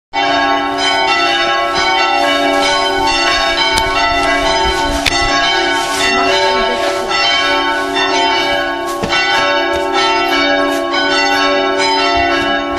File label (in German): Gelaeut; Eilsleben